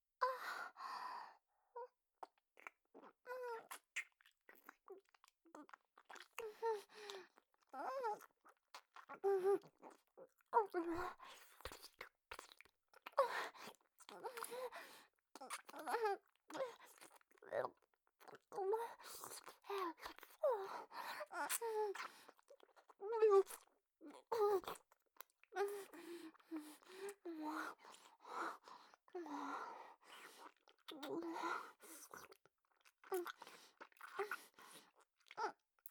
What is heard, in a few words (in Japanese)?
ちゅぅ…んちゅ…, 右耳